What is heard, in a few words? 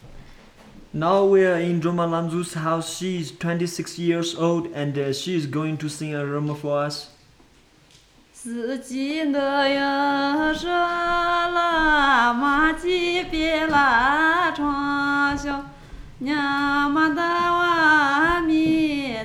Nyi shar Folk Song